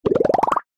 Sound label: Stylized Bubble Pops Ascending; SoundsCrate